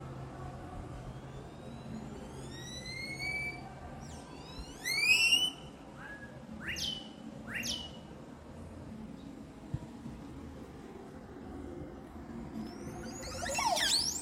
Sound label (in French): bizarre chants d'oiseaux, costa rica